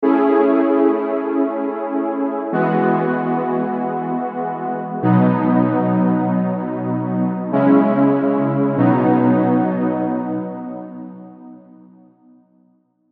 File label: Warm Be Kind Pad